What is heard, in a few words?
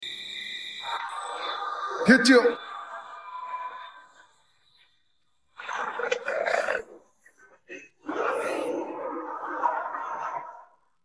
vocals